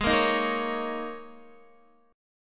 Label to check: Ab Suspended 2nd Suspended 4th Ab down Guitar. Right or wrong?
Right